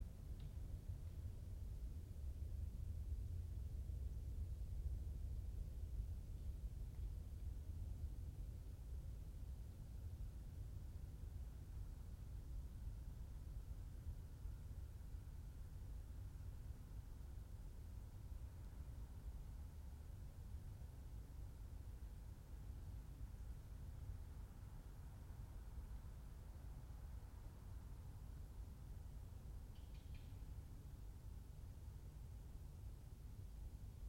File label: yamanakako sound